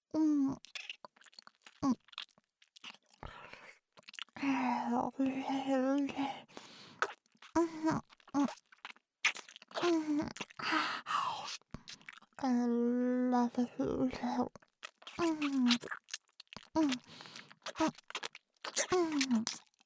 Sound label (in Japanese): フェラリピート; 寝取られAV蒼衣桜ちゃん; おまけフェラリピート30分